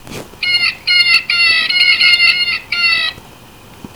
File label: Bluejay